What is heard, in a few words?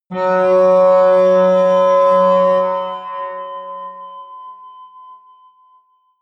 atmospheric feedback synthesizer medium, drones